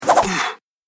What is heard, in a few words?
Wing Chun Rapid Strikes And Impact Oof, Punch And Hit